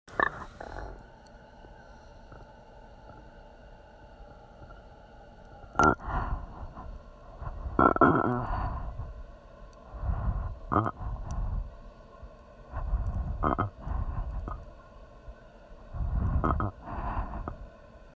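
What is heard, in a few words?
Gurgling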